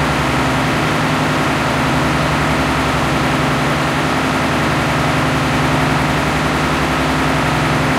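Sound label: pt76 engine interior rpm 33, tanks; War Thunder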